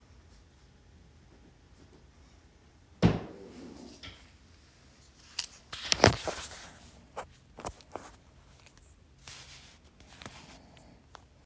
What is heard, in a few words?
Sound in wall